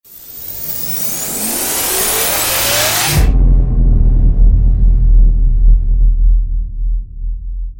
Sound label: rise to hit; light speed